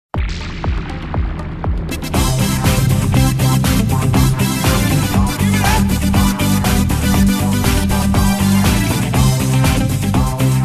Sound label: Karaoke Version